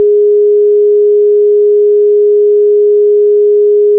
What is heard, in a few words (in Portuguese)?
nota SOL1 S 415.3Hz; Instrumentos Musicais